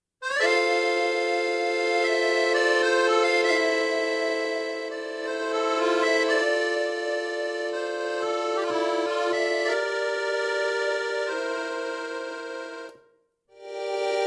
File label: Accordion, French Song